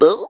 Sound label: vault vocal; Scrat